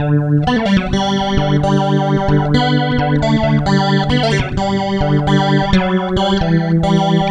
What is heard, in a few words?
guitar flanger